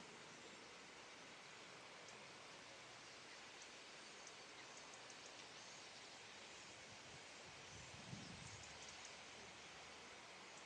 this may sound like static but is actually 1000s of starlings at roost; leighton moss